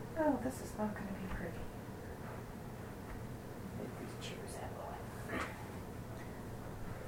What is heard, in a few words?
ghost box